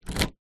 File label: car key into door lock external; transport